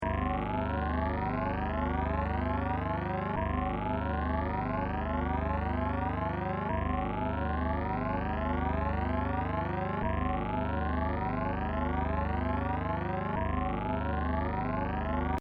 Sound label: Scifi Space Electric Hum Gyrations; Weird oscillations like from a strange machine, reactor, force field or magic; Tech Sound Effects